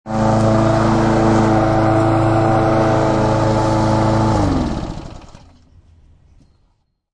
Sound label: Lwn Mwr Trn Off, Lawn mower is turned off; Power Tools (Sound Effects:Tools)